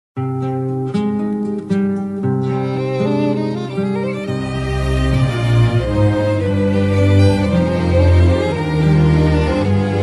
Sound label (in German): violin